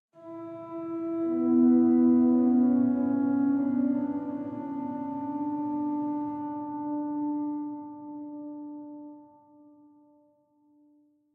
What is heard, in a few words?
Organ Mid